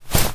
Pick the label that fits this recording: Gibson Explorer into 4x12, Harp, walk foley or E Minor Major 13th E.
walk foley